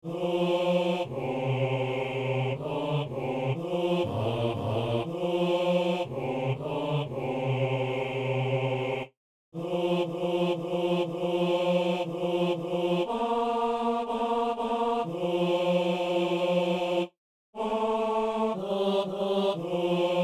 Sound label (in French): basse